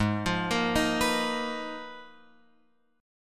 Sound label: Ab Minor 6th Add 9th, Guitar, Open F; arpeggiated; x,3,5,5,6,6